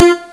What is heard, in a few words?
stabs